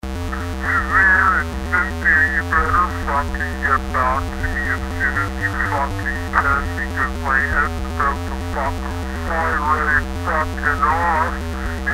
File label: 8bit flying heads